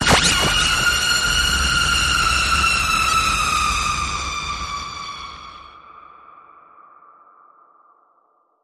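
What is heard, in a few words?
Blast Falling Skies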